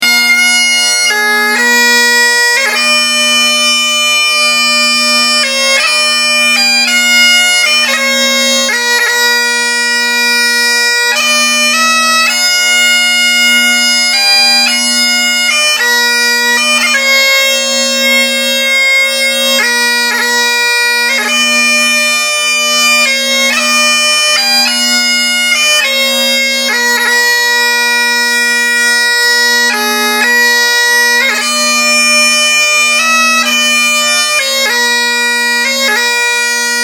Great Highland Pipes; bagpipes

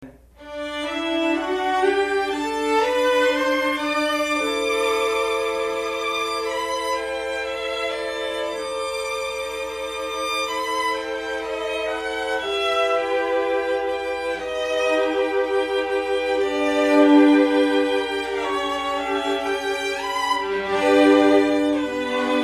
string quartet; Monty Bloom Ensembles

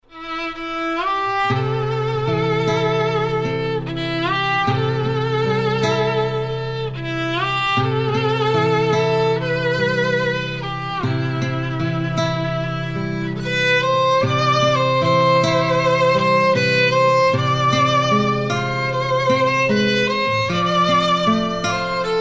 Violin Guitar Crying; Classical; Violin Guitar Musician

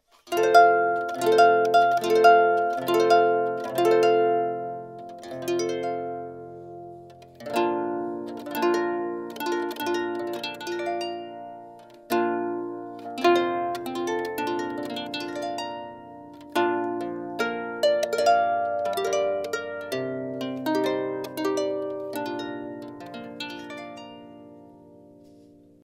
Bridal Chorus, harp; Here Comes the Bride; Orlando Harp Music

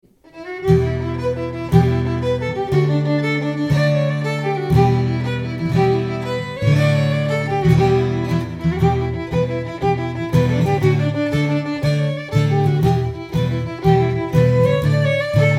Swallowtail Jig, Classical String Quartet

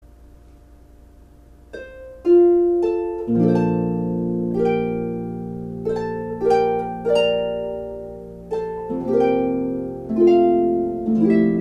Harp

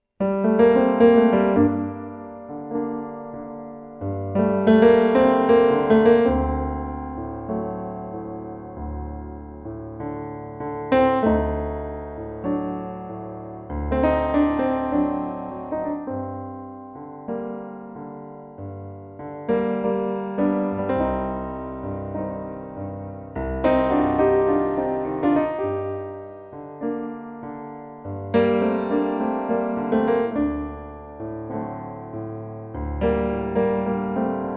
Piano; Latin Musings

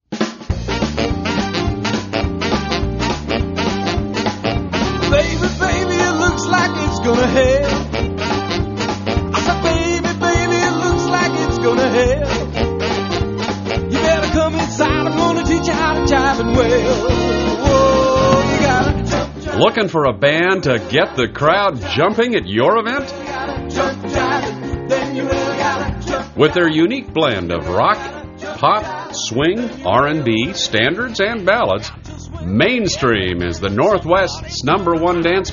Variety Dance Music

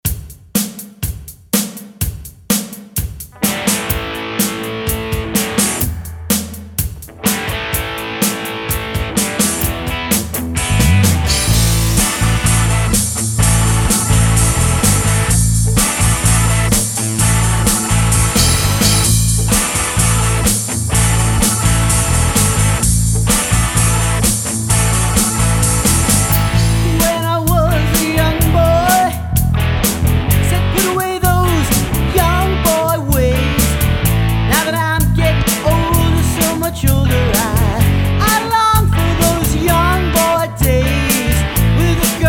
Cover Band; Crosstown5

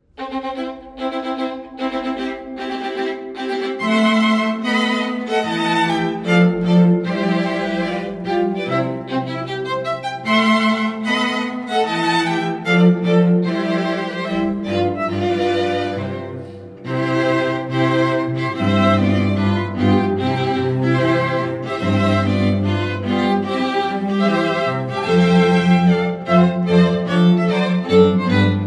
String Quartet; Wedding March; Mendelssohn

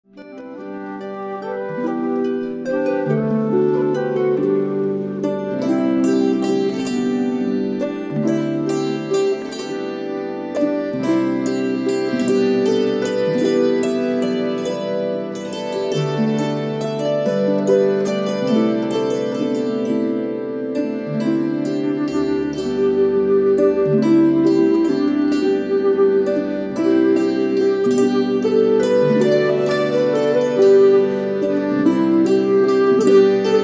Irish Wedding tune, Celtic Duo; Give Me Your Hand

